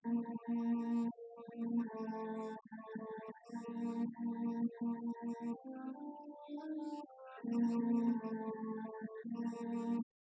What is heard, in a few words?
nodrums; SiSEC10; underdetermined